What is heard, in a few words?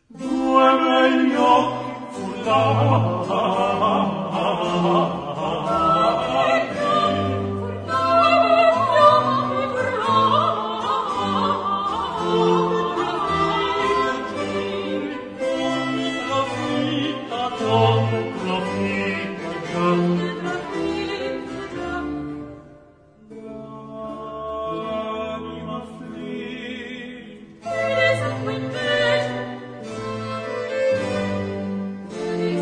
Madrigals; Monteverdi; Altri canti di Marte; Due belli occhi; chorus